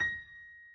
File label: key